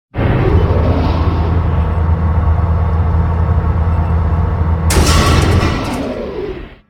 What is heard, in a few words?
repair, deep space repair; CosmicRage; CosmicRageSounds; ships